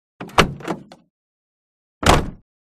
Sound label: Door Austin Sixtee